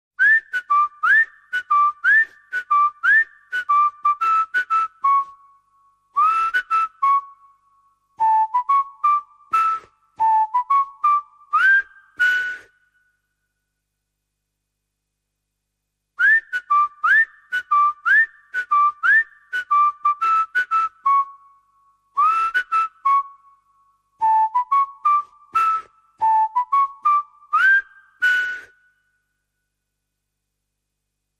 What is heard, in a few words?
Whistle Only